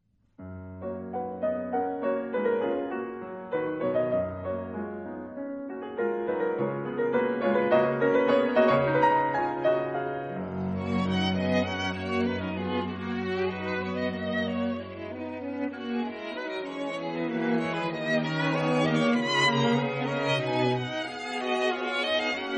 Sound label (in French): Quintette